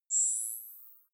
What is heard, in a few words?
zoom in light; signale